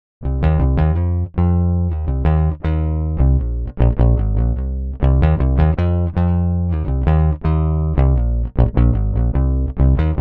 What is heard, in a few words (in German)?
E Bass